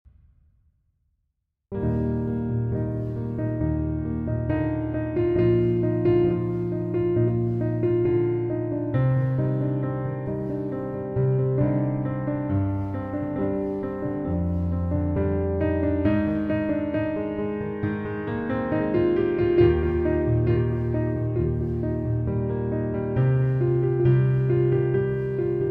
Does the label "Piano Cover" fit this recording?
Yes